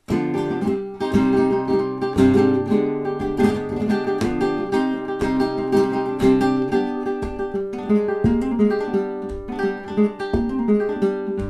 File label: fandango, flamenco